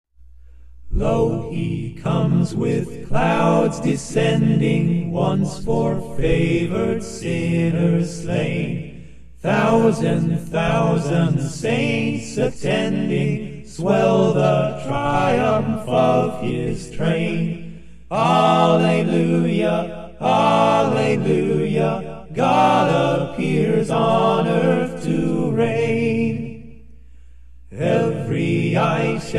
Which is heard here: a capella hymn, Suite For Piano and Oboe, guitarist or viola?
a capella hymn